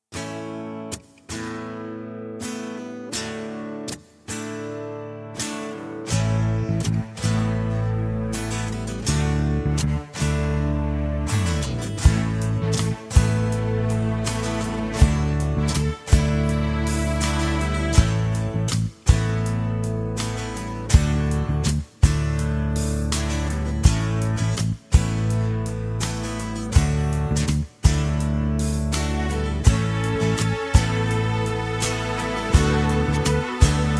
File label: A No Backing Vocals